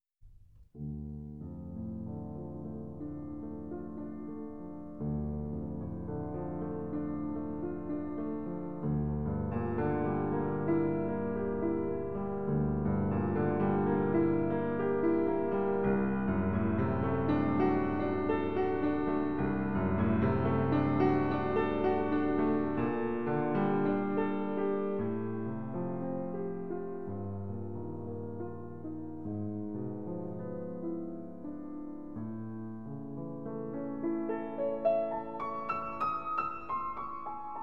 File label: Fantasy D minor; Mozart; Legend of Galactic Heroes; Galactic Empire SIDE